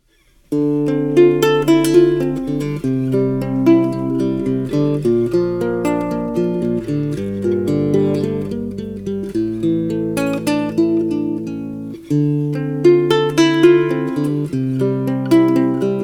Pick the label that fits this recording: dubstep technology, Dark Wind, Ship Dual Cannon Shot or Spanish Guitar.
Spanish Guitar